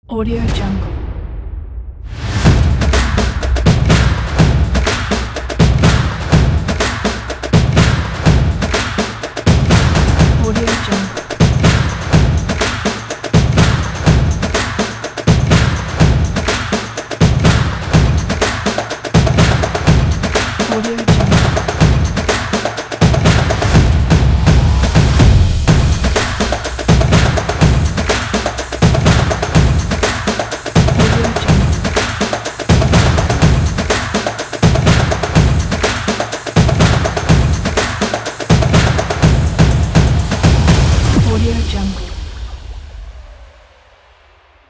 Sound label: sport fashion technology upbeat percussion